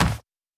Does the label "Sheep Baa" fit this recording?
No